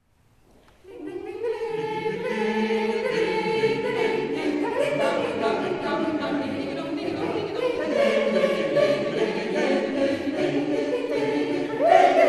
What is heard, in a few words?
Hora Staccato; Roemeense folk; Het is een zigeunerachtig getinte muziek, levendig en vol energie